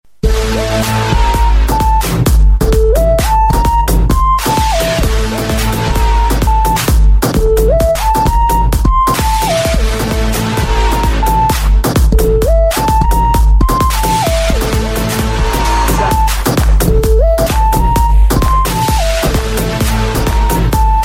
Hard Trance

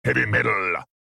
Heavy Metal

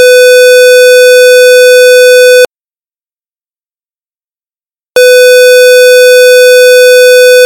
500Hz

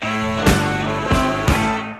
Harmonica

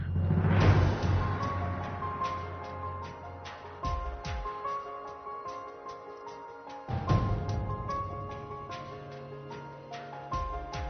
Romantic Piano

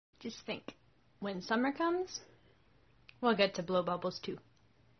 Bubble girl

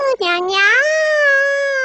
Nyaaaa; Da Capo

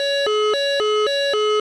F18 Missile Alert

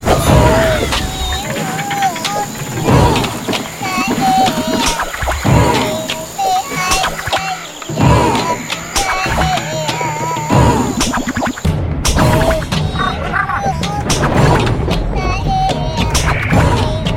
Arabic; Babel Rising

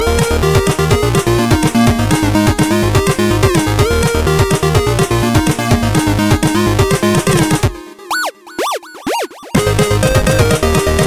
8bit

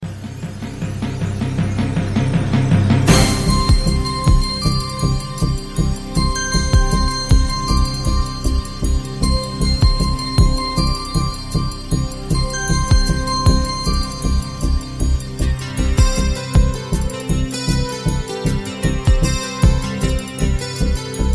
Beat With Bells

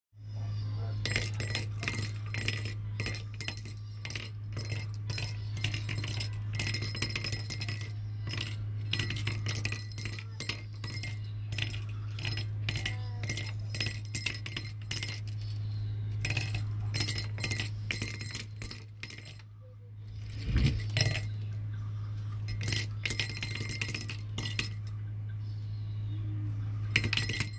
Rattle

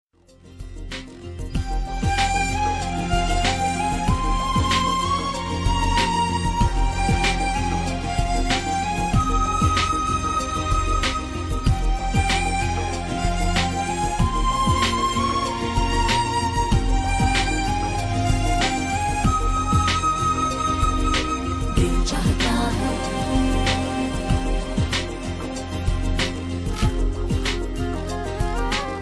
Best Flute

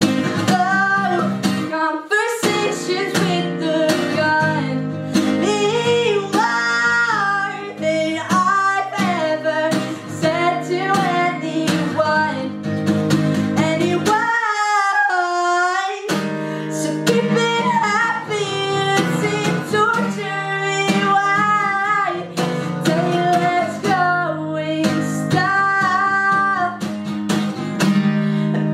singing; noahfinnce

